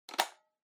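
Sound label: Portable Cassette Fast forward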